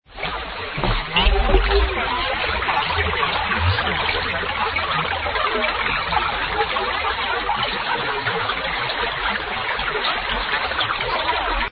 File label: water Remix